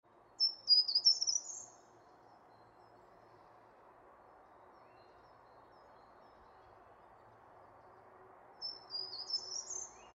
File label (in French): grimpereau des jardins certhia brachydactyla